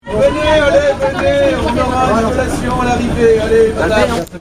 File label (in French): allez allez, Champs Elysees Paris; thomas debesse